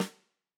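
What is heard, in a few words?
Tam Snare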